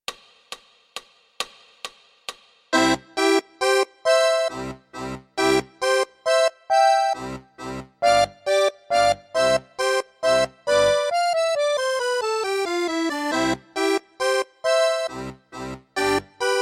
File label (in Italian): Basso Tuba, Czerny BS in semicrome; Scuola dei Bassi - dx-3e-Semic - sx- B.S.; 6-8